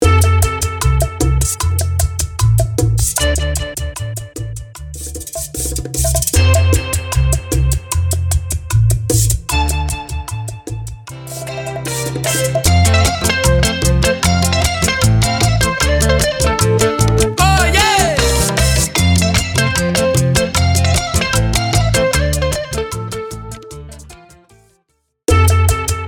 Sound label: Simple Intro Outro 152 BPM